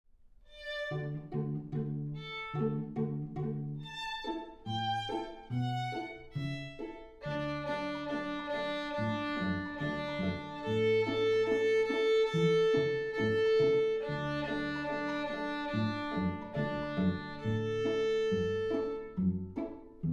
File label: with violin